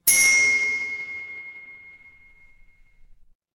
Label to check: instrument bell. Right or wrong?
Right